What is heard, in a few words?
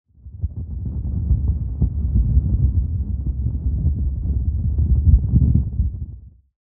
low freq thunder